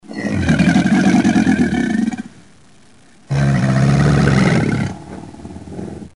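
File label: krokodil golos, crocodile voice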